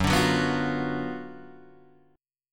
Gb Major 7th Suspended 4th Sharp 5th, Guitar Open G